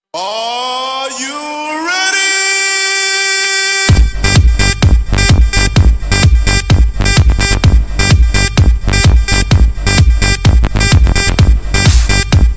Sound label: Funky Mix